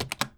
Keyboard - Key Combo; Keyboard Sounds

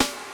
Resonant Snare, Drums; BB 2HTC Samples Vol 4

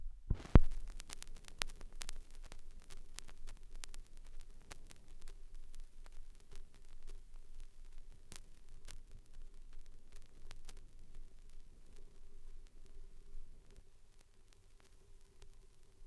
Ambient Noise, Record Fuzzies; Micro Pack

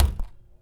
Good Thunk; BB Retail Therapy Sample Pack

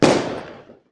bang; bang sfx

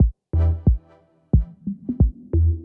Nice and Simple Drum Loop; Drums; BB 2HTC Samples Vol 4 Addendum

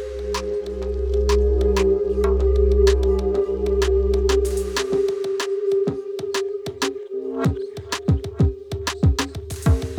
Eerie, Pads; BB 2HTC Samples Vol 4

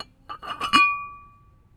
Ceramic Bowl; BB Retail Therapy Sample Pack

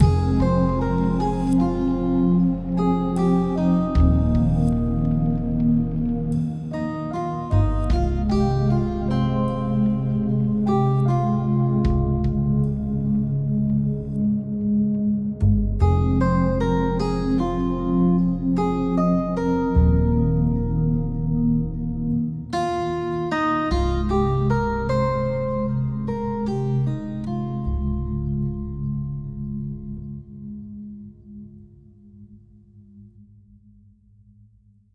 Plucked Guitar; Solos

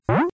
phaser Up; kenney digitalaudio

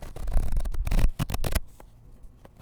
Flipping Pages; BB Retail Therapy Sample Pack

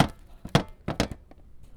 Metal Clank; BB Retail Therapy Sample Pack

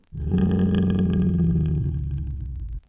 Growl, beast or animal

